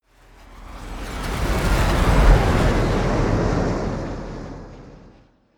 flyby

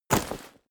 step leather light

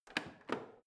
plastic button creak press

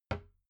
cabinet door close soft

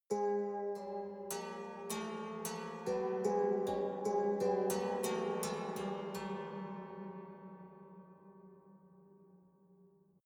haunted strings chromatic sequence

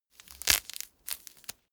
mandarin peel sequence

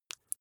flesh wet greasy squish small

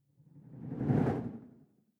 whoosh simple flutter phase low

